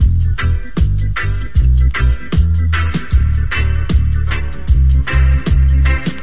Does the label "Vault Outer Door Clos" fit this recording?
No